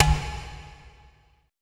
E TABSLP #F, ETH DRUMS

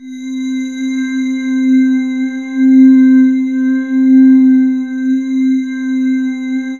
pad c, Chillout ambient Synth Pads

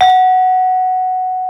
BRONZE, Bronze Gamelan; E-MU Formula 4000 Series Vol. 4; Earth Tones

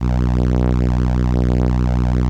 ELKA, String Machines; ELKA RHAP

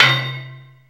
METAL, METAL PERC; Zero-G Ambient 1

